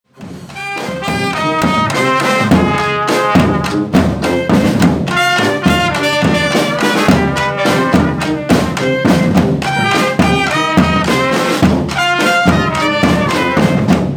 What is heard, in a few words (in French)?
Cuivre et Batucada